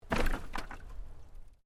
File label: Wood Planks Move